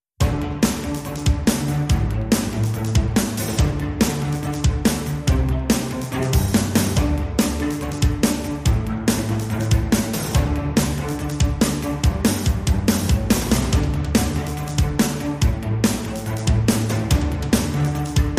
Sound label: Drums and Bass Mix